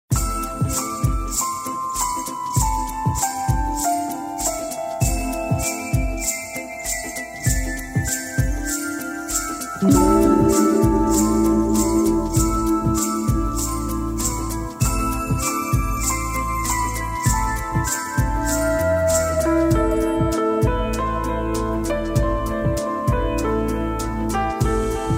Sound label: holiday atmos